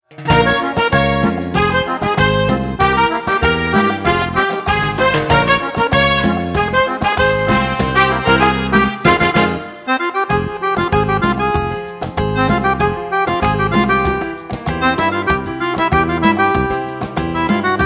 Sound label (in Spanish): CUMBIA